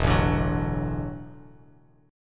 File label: E 9th Suspended 4th E down Bass Standard; E9sus4 strummed; JGuitar